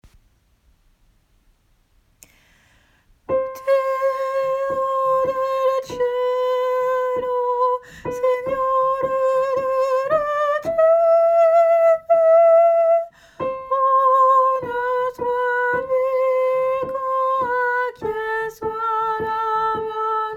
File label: Tenor